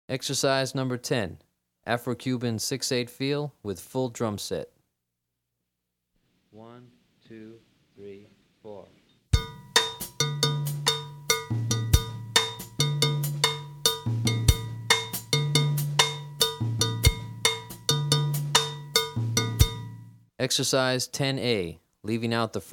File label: Afro-Cuban 6-8 Feel - Full Drumset